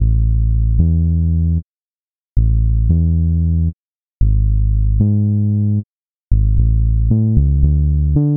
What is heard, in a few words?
Pop Future Pop